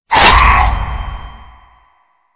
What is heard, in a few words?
Stinger; STINGERS